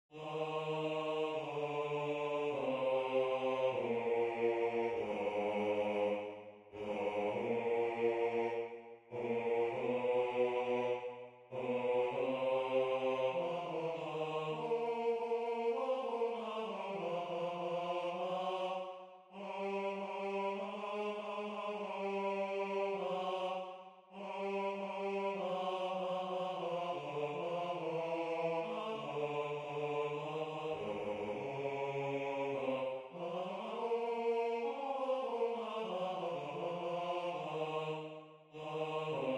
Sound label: Bass